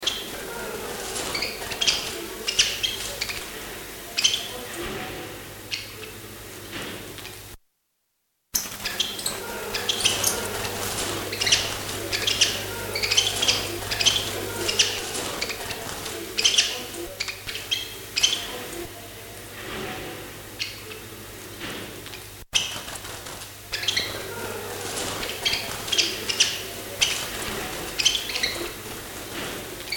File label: birds loop, mono; open studios 2010